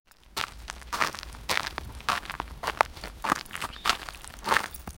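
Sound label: bear walking on dirt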